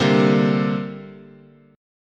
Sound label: C 7th Suspended 2nd, chord